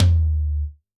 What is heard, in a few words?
Tom, drum